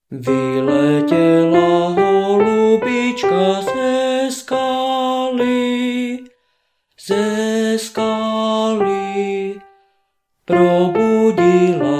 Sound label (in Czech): Muzsky Zpev Klavir Muzsky Zpev Xylofon